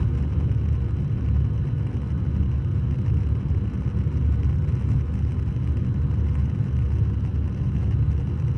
Fire Grate Loop

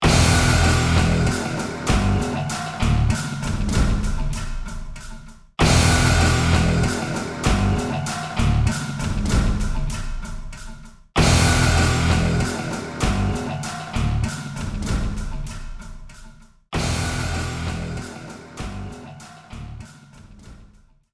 long stab

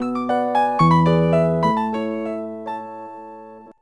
Nokia Tone; Pimp My Ride; PS2; PSP; Wii; Xbox 360

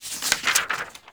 OPEN LETTER

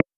Affirmative Stereo

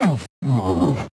Death; Joblin Joblifter

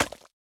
Slime Impact Small

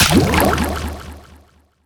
Enemy Bigclam Cell Open

